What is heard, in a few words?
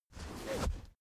car armrest backseat close fast